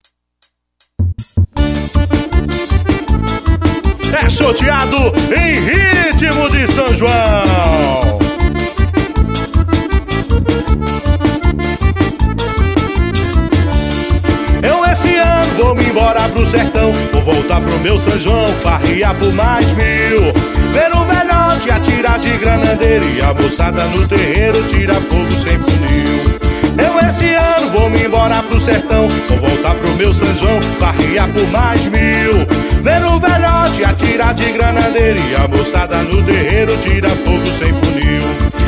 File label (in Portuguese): forro xoteado sao joao